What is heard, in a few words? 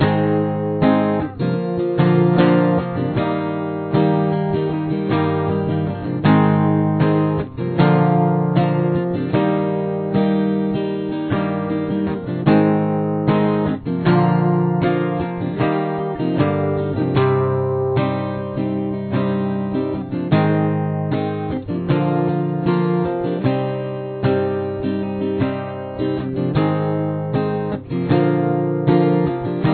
chorus, guitar